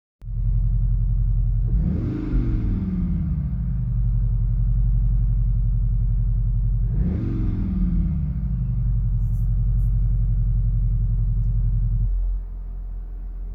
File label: Cupra Sound actuator